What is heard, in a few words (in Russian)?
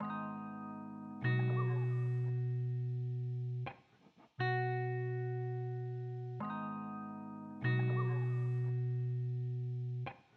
zatsiklennye melodii dlia gitary loop